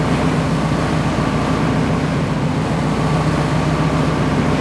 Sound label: Transport Run Loop; Civ4 warlords